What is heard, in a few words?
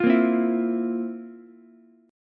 F Major 7th Suspended 4th F, Ukulele; FM7sus4 strummed; JGuitar